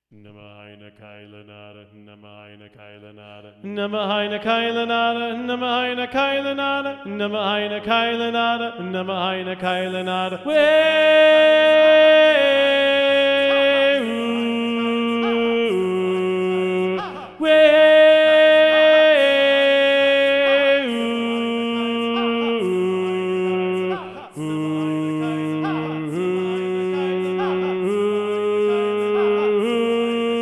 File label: SATB - Tenor 1 Predominant; Tenor 2 Predominant